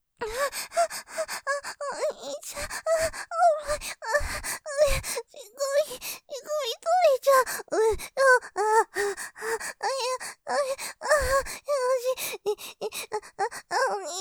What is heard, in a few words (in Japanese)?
喘ぎ さらに切なく感じちゃう・気持ちよくてとろとろ; モノラル; ループで楽しむ！シェフの気まぐれ抜き抜きコース